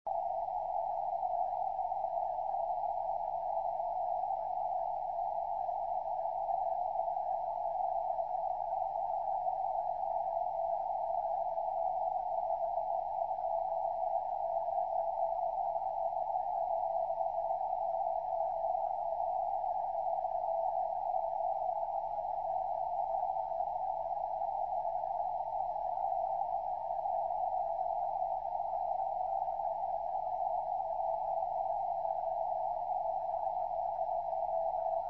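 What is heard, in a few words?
CW, 432 MHz, amateur radio; DD3SP JO72EN 269; NAC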